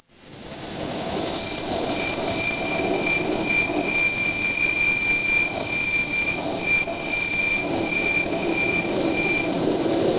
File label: ambience forest